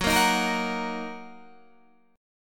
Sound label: Fsharp 6th down Guitar Open D